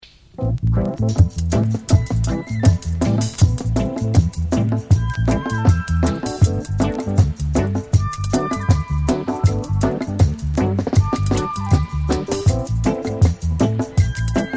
Oboe; Studio One

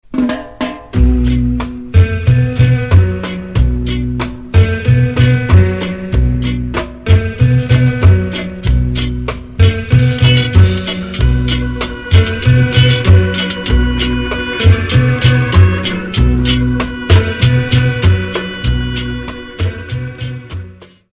Rocksteady Beat

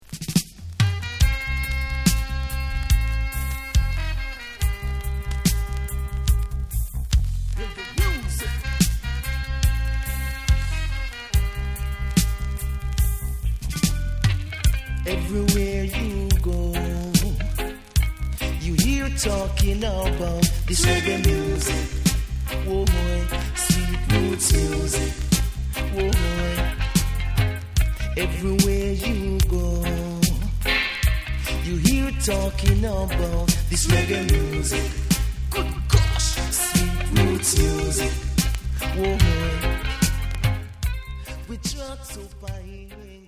Reggae Music; Greensleeves

